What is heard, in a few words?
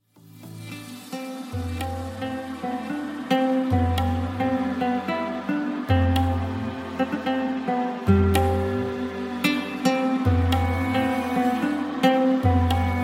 insturmental ringtone